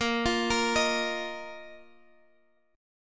A sharp Suspended 4th A sharp down Guitar Half Step Down